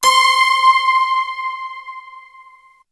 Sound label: PING; BEAT2000